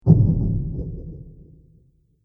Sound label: Deep Heartbeat Impact; Miscellaneous Impact Sound Effects